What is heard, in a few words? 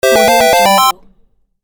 8bit harmony melody